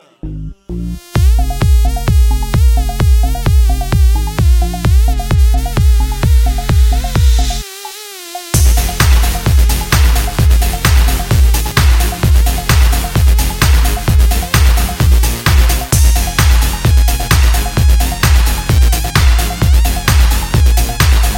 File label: Cool Tone